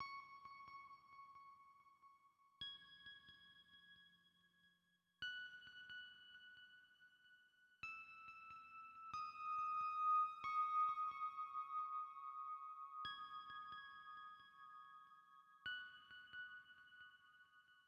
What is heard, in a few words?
Piano Pluck